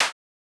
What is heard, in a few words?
Clap Snare